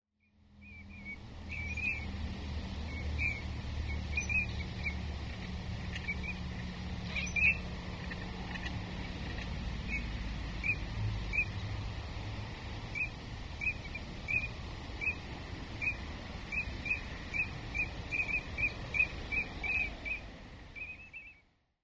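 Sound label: kogamo, Eurasian Teal; koe; yatsu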